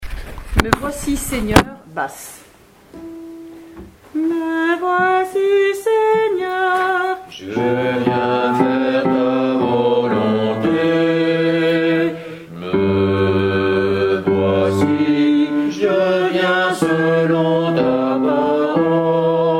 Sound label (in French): BASSE